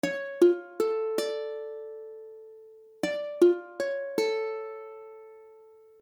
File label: Dmmaj7, ukulele; ukulele akordy